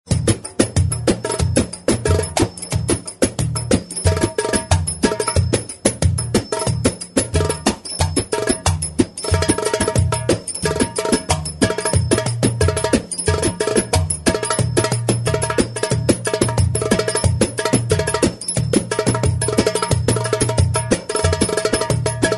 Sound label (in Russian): Zvuki barabanov